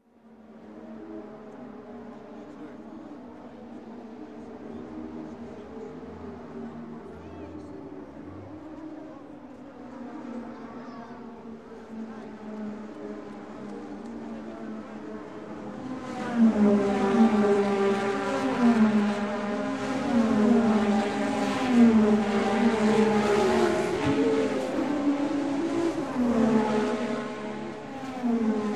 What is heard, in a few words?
Formula 1 Race; Sound Ideas; Series 2000